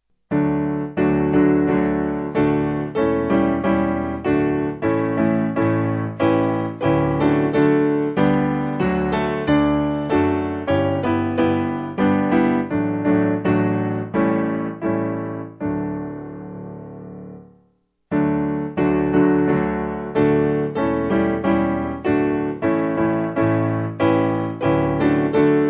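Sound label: Piano, church music backing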